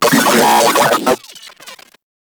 Moa Vocal Death; Warframe

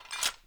Foley Action Metallic Punch Fill Short; Arm

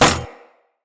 Rolling Drone Landing; Characters; Grineer

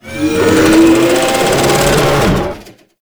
Thumper Charge Groan; Grineer Thumper Bot; Warframe

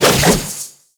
Dodge Whoosh; Warframe; Wraith

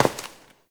Step Metal; Warframe; Koumei

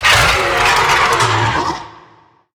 Necramech Melee Death; Battle Barks

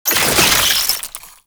Oraxia Brood Spider Spawn; Warframe

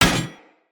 Hammer Impact Metal; Weapon Impacts; Player; Environment; Tuvalkane Hammer

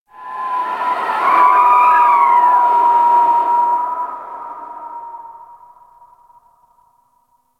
Ice Cold Wind Whistle; Warframe; Corpus Ice Planet

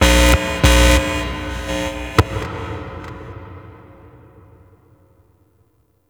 Stage Amp; Warframe; Ambience; Nineteen Ninety Nine; Obj Temple Defense

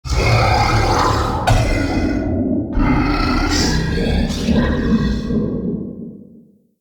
Root Cave Root Chatter; Ambience; Neathuns Root Cave

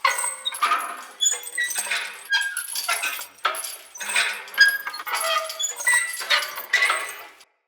Path Lantern Swing Loop, Hanging Lanterns; Dendrit

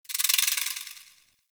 Foliage Insect; Duviri

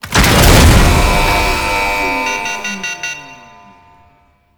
Elevator Slow; Gameplay; Warframe; Jade Shadows; Ascension

